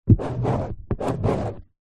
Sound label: office pen ball point draw empty card box